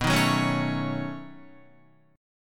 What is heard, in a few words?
B 9th B down Guitar; B9 strummed; JGuitar